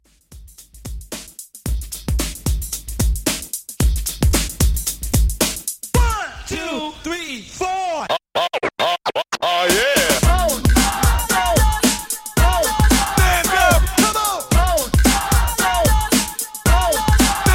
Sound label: Party Breakz Redrum 112Bpm